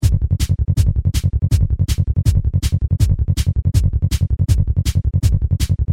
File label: rhythm